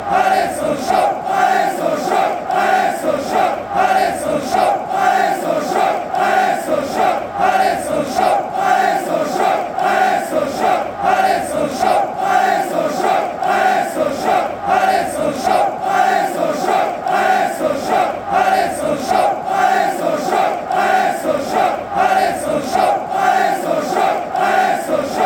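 chant de la victoire au stade bonal, football songs and soccer chants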